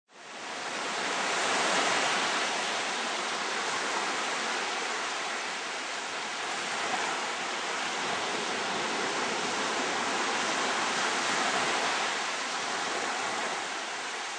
Idyllic Beach Calm Waves, Light, calm waves lapping; Light, calm waves lapping up a white sandy beach on a tropical island